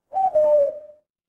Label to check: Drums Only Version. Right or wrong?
Wrong